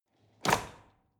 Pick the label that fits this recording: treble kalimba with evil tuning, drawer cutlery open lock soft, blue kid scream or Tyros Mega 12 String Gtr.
drawer cutlery open lock soft